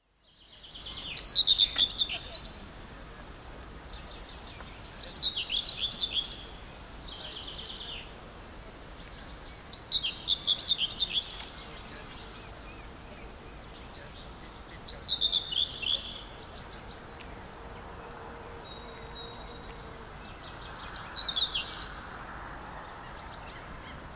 pensaskerttu; linnut

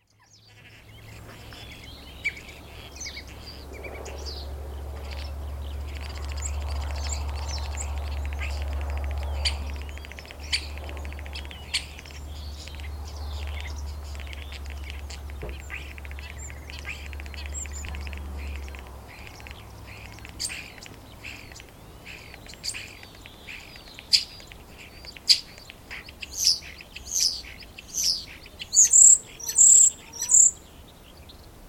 kottarainen; laulu; linnut

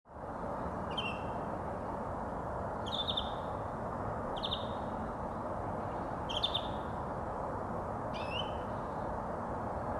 viherpeipon syksy; linnut

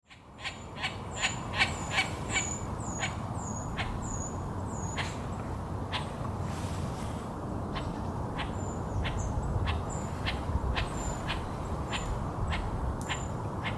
emon kutsu; silkkiuikku; kutsu2; linnut

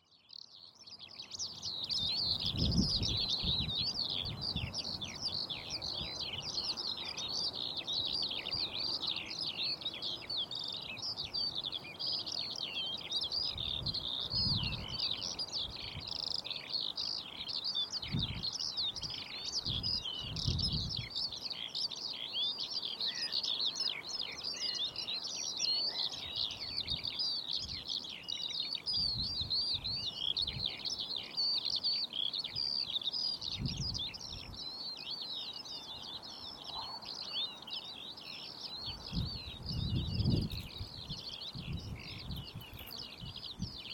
kiuru; laulu; linnut

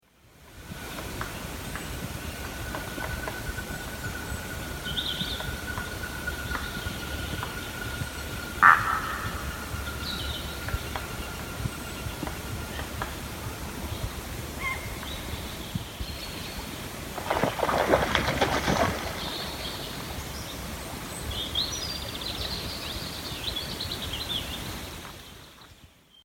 telkka; linnut

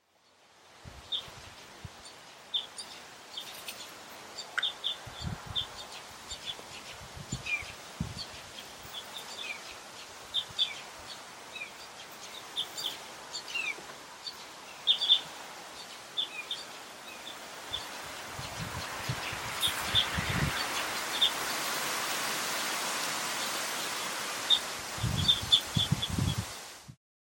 kapyparvi; pikkukäpylintu; linnut

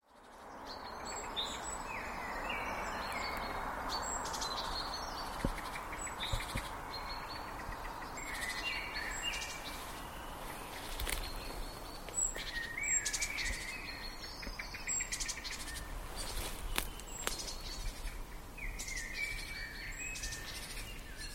mustarastas; linnut

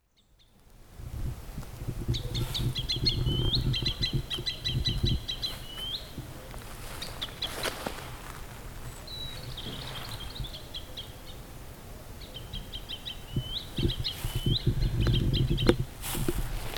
metsaviklo lento; linnut

